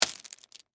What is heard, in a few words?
Impact Stones Single, Drop, On, Pile; Rocks; Materials; BlastWave